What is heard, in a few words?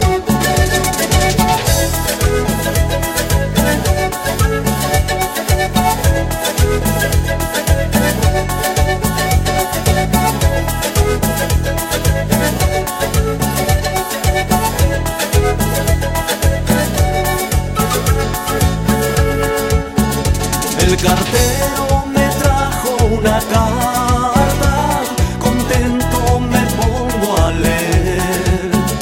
spanish song; latino